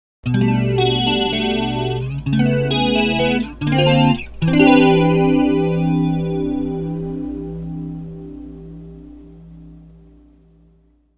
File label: Flanger